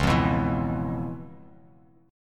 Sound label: Db 7th Sharp 9th Db; Db7#9 strummed; JGuitar